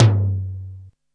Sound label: R8 Tom, Roland R-8